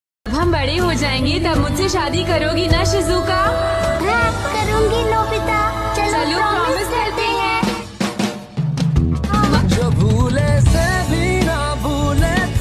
ringtone

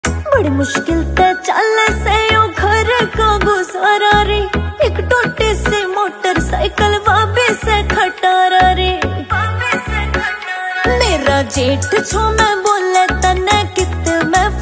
haryanvi ringtone

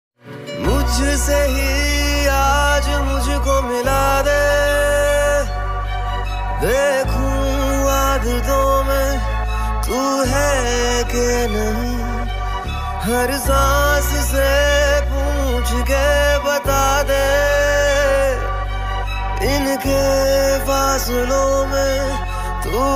Romantic Ringtone Hindi Ringtone

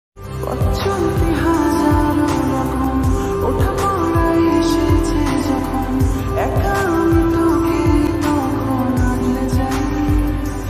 slowed bengali ringtone

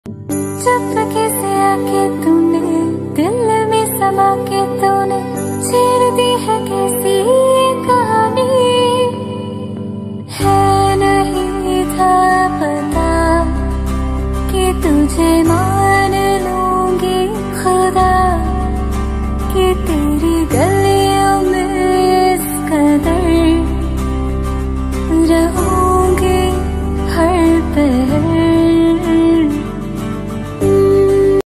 female version, hindi ringtone

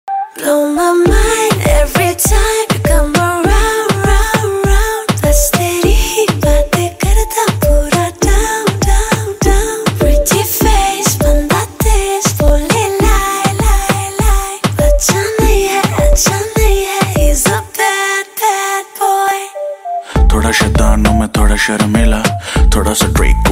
ringtone

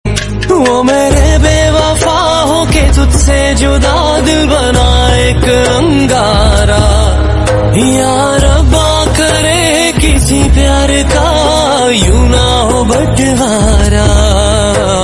OST pakistani ringtone; Uraan

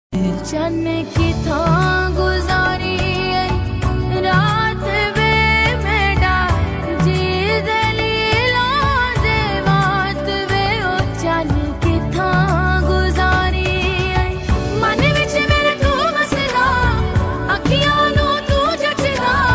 pakistani ringtone

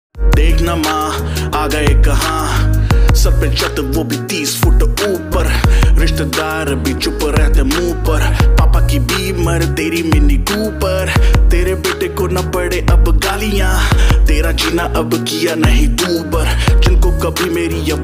hindi rap ringtone

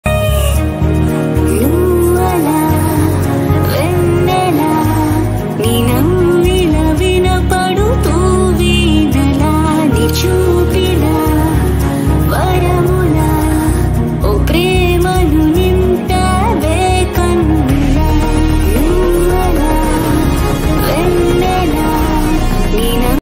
female version telugu ringtone

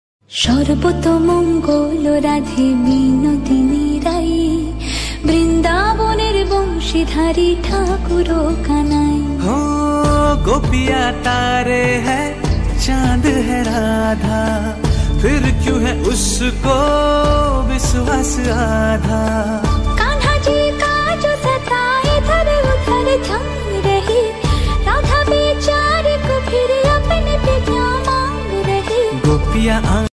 Bengali hindi mashup ringtone

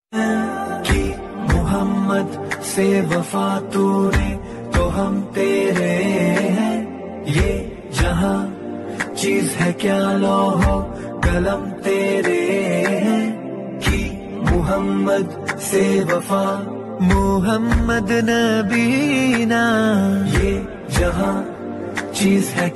arabic ringtone

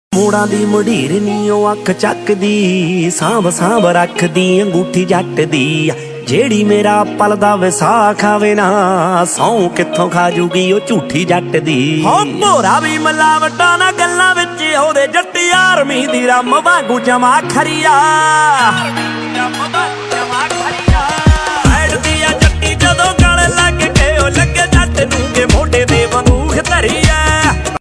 punjabi ringtone

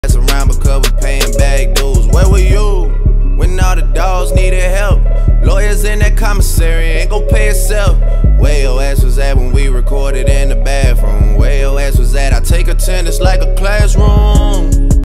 hindi rap ringtone

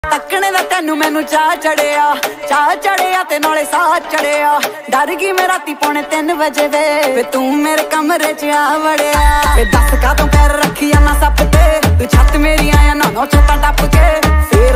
punjabi ringtone

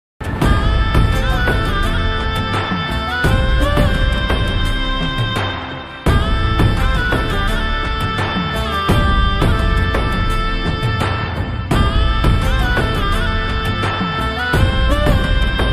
Emotional BGM ringtone; Salaar

